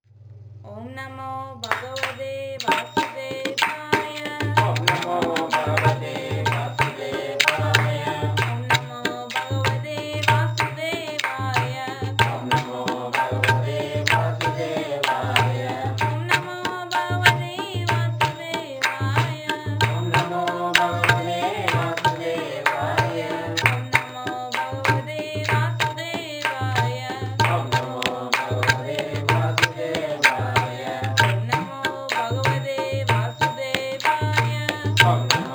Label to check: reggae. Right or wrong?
Wrong